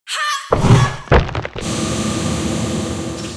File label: Mob die; Monster; SubBossmob; 욱일승천류; 미츠코